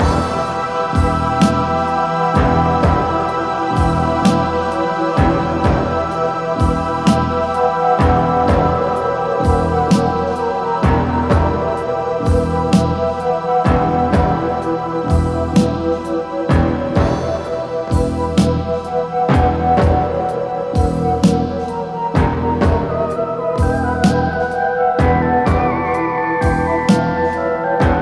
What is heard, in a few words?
dawn loop; desolation